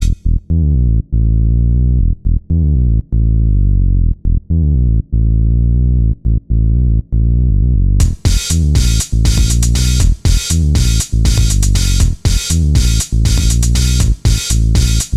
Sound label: Bass